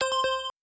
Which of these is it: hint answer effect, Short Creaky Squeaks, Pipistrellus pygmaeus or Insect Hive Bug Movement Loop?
hint answer effect